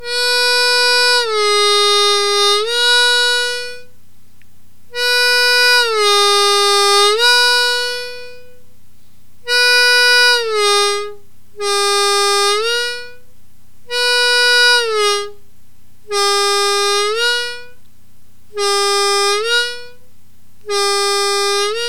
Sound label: bending, harmonica